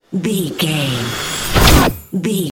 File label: magic conjure charge